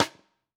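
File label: Pearl Piccolo Side Snare On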